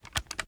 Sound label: reload empty drum grip; gmod